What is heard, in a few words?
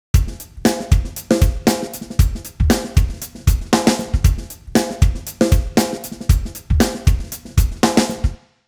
drums 90; digidesign pro tools ts; timestretch